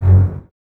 BSS F#1, String Ensembles, Basses, f Legato; Miroslav Vitous; 90 s Sample CDs

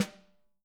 WARM GLDSTON, Living Drums; Sampleheads; Peter Erskine

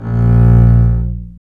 STR ARC BS, Cb Bowed, Cb1 mf vb

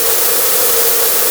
A#4 SNARE; Northstar; The Wizard VOL-1; DRUM INERTIA

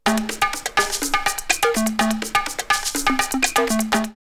LFO 124, drumloops, Rave; X-Static Goldmine; 90 s Sample CDs

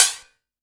F#, synth line; 90 s Sample CDs; NiceBeats; SynthLine VOL-3

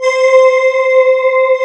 CMI ORGAN

